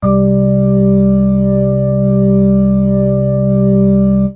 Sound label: octave note, Organs